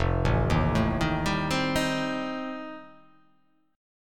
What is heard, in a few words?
F 9th F, chord